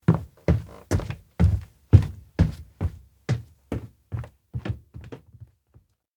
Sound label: steps indoor abandoned cafe walk thud; anemoi